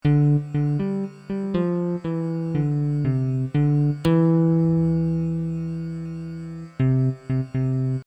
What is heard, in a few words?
Sitar C; Khani